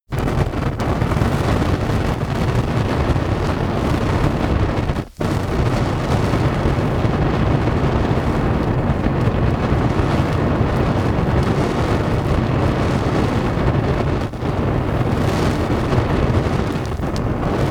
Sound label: fire burst